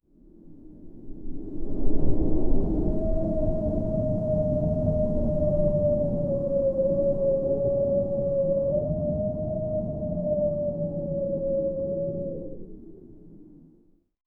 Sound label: wind sweeping calmly wings, gliding